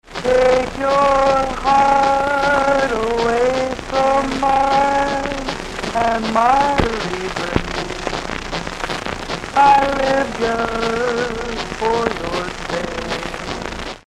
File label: Original Man Singing